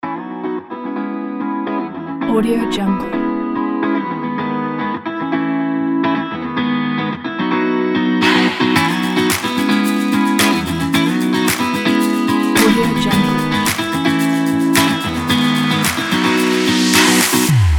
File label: party music kit